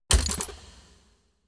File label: Fire Mine